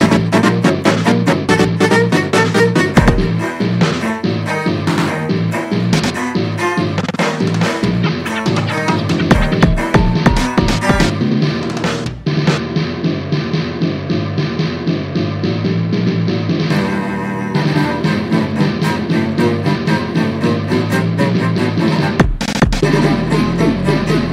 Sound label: Dirge last wave